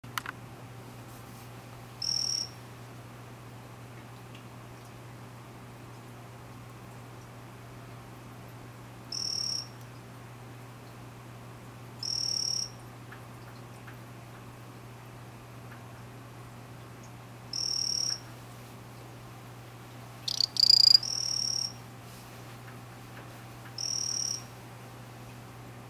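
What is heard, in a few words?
Ranitomeya Vanzolinii calling, frog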